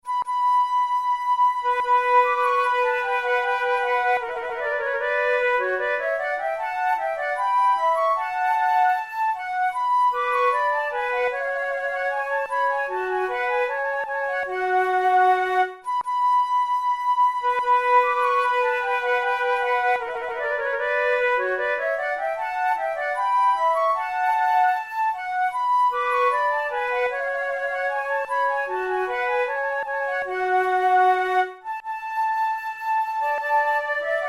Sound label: concerts for two flutes allemande; monteclair